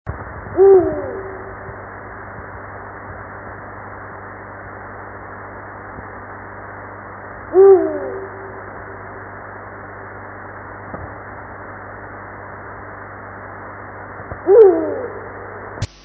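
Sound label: eagle-owl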